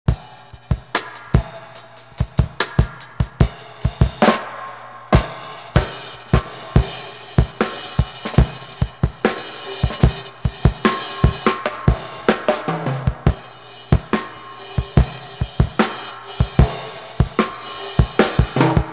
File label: Drum Test Reel to Reel